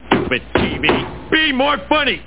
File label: chew; Games World; New Game Levels; Duke Nukem; Epic